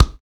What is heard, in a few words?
Human Perc, Body Perc